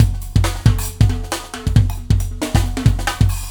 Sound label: Warp Drive Beat, Processed Drumkits